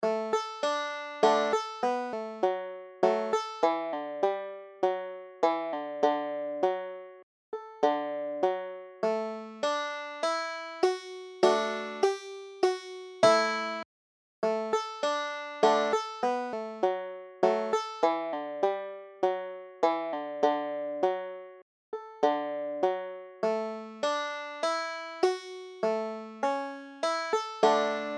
banjo

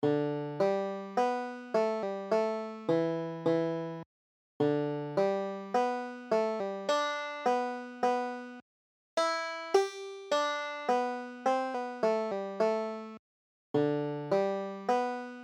farewell to whiskey, banjo

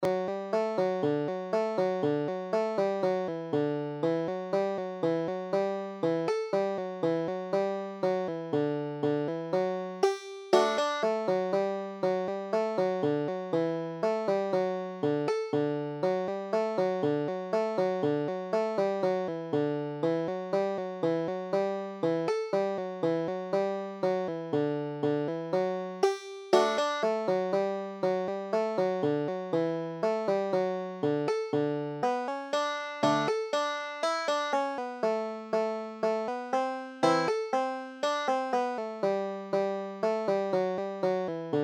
cuckoos nest, banjo

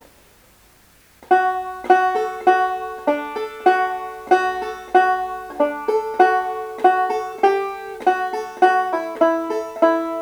golden slippers D, banjo

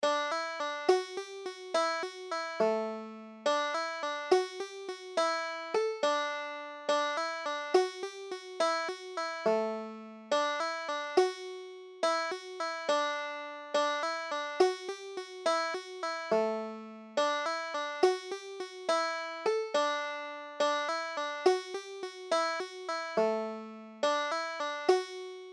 jig, banjo

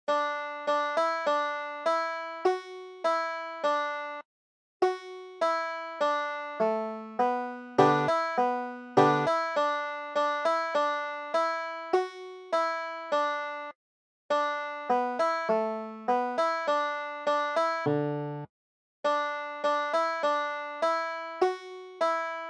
sugar hill, banjo

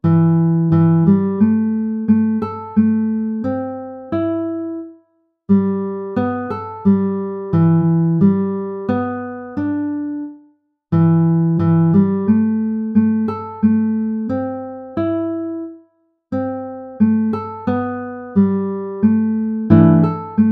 frosty morning, banjo

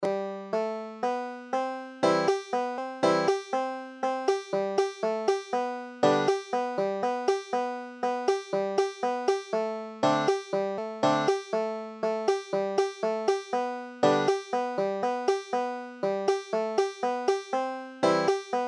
banjo

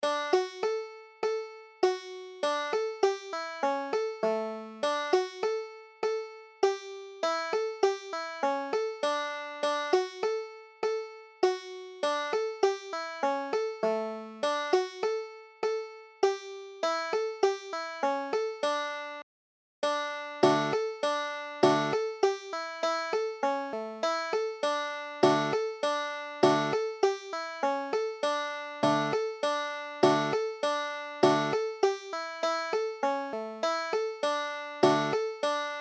molly put the kettle on, banjo

